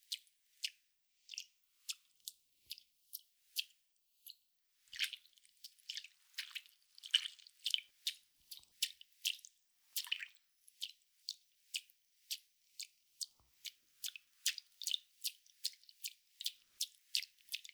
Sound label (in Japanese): ぴゅっ, 水音効果音